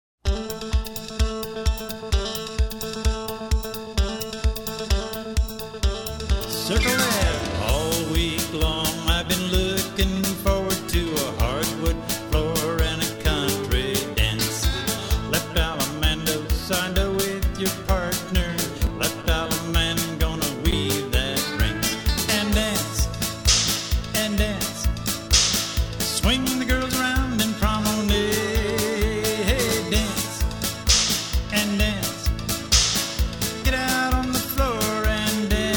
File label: dance vocal; square dance music